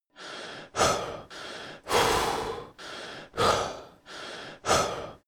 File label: breathless